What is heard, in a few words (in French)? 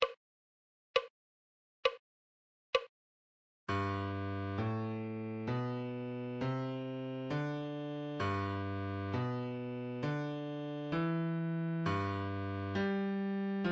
Clé de Fa Adagio